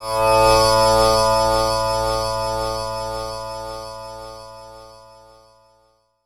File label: TAMPURA; Spectrasonics; Heart of Asia; INDIAN SET